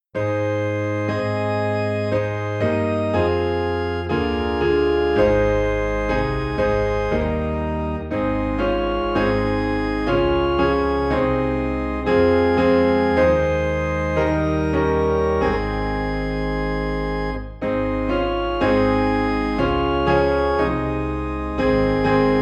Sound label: Piano and Organ in A Flat; How Firm a Foundation